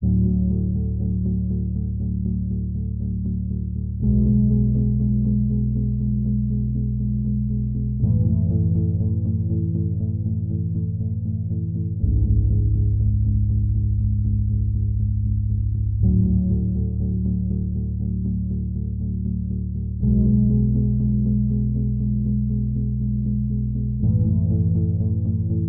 Dream SEQ

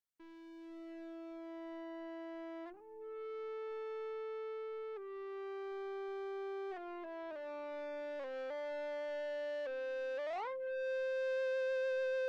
Ebow Ensemble; Mille Regretz

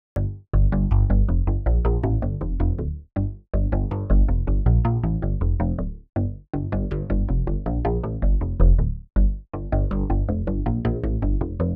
Bass

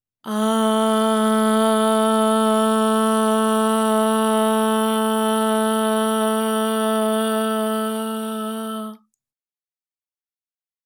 Ahh 432 hz DRY; MixterPlus

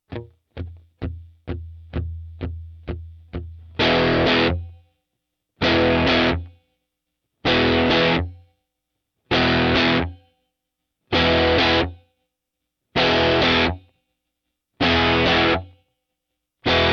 E min Gtr

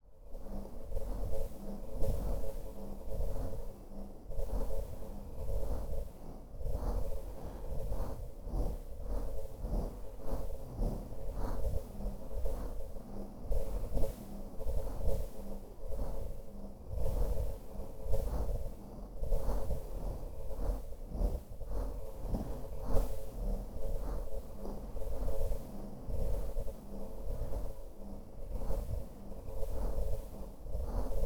Cm72 Zone Ambience

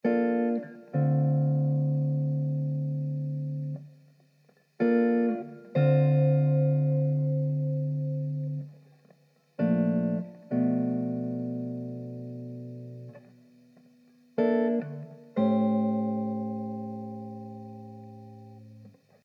Dm100 Jazzy Riff

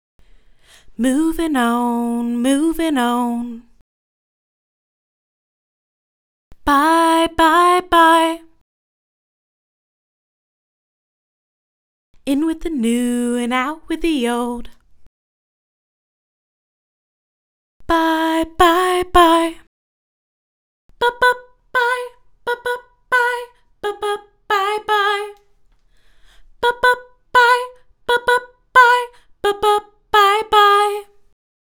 back-up vocals

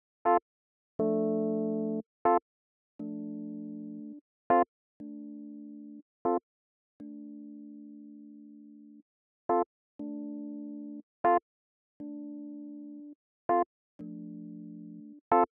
riffy Rhodes

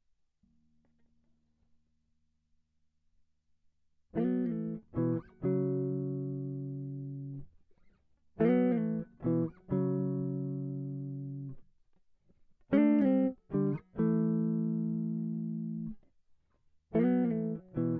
Jazzee Moteef

